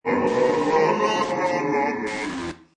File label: Ghostly Communication, Ghostly monster growling sound over electronic transmission; Ghostly monster growling sound over electronic transmission or electronic device, sound breaks up; sound breaks up and distort; Ghost Sound Effects; LynneMusic